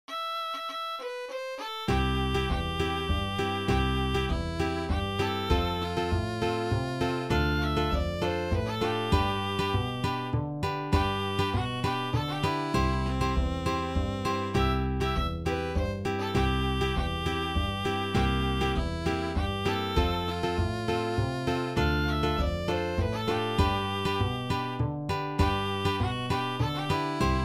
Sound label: mazurka